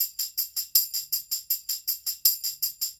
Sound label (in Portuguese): MEIA LUA LOOP